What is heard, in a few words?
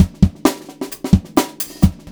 ACOUSTIC, Big Beat Drumloops